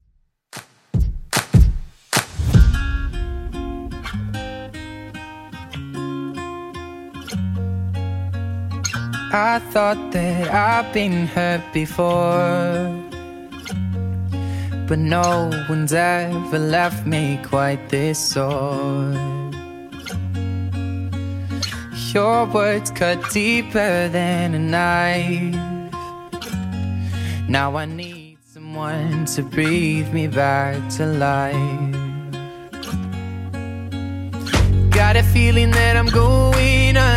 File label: 2ks Redrum 150bpm